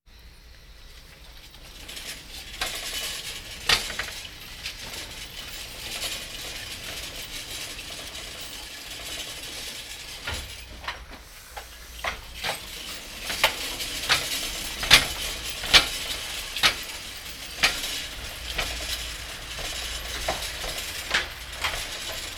Hospital effects

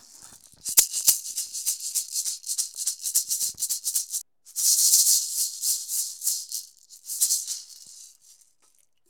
Percussion Native Maraca; SFX Kit; Foley - Percussion

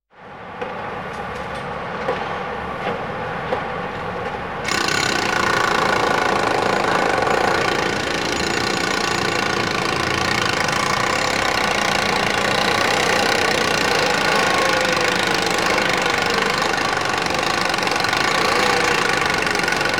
Demolitions City Ambience; KMP Film Video Sound Effects

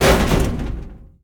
Metal Rattle Laundry Chute; Foley - Rattles

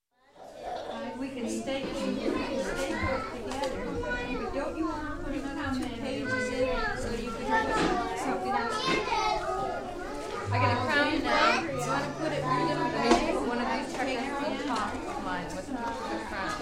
Children Nursery School - Playing With Teachers; Ambience; Sound Ideas 2000 Series

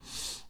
breath heavy out; Apotheorasis

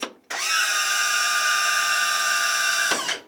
Plane P40 Servo Wing Flap Down; Airplanes

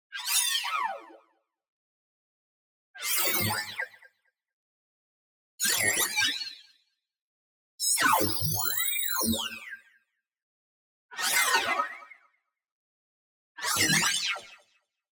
tonal, friendly ascent up; boom library; Anime Essentials

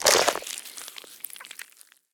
zombie bite; DeadFright

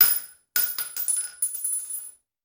Bullet Shell; Sound Ideas; Guns

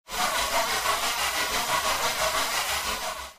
Force Field Pulsating, Sci Fi; 1001 Sound Effects

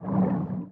skid sea side; Blind Drive

